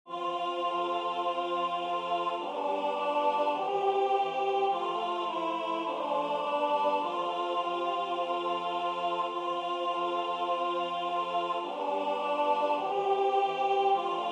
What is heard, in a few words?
Ave Maria Alt